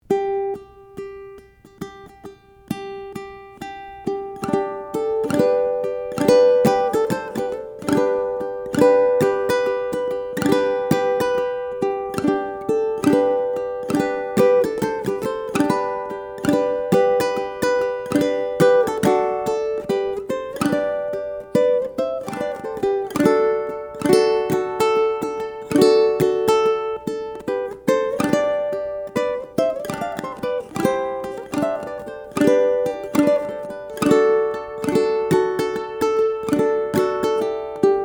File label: Drum; lil Drum boy